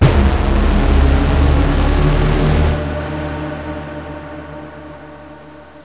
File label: alien humongo, ambience